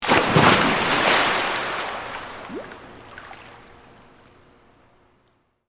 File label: Pool Indoor Dive, Water, Splash; Pools